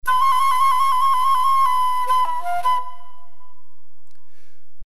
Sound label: flute